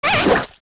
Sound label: Octorok Die; OOT